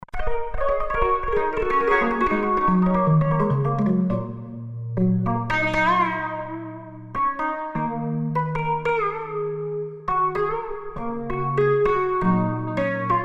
Strings; Cypher2; FXpansion

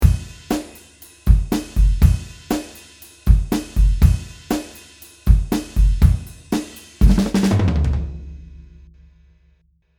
Drums Boom59 Mode high

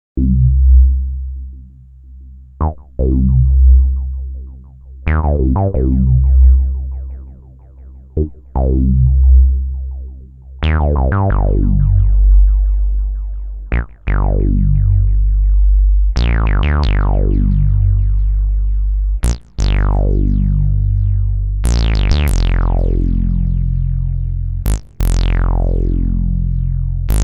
pulse solo bass

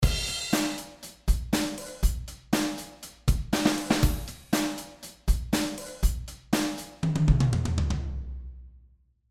ludwig kit stage

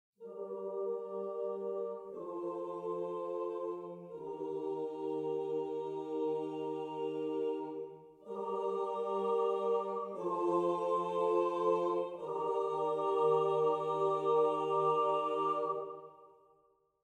Full Ens Choir; Nucleus

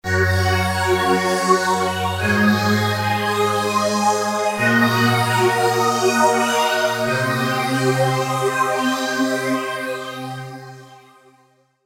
Pad Analog Phasy

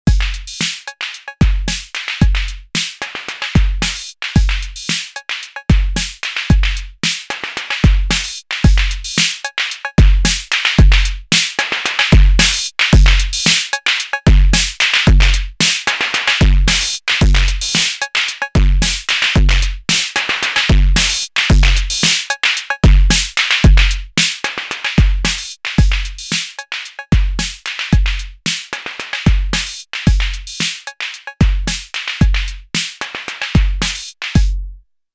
Arturia DrumBrute Impact Pattern

